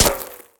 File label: gun miss; Blind Drive